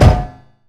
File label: epic metal hard